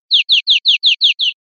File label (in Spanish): golpes corridos tiotio, verderon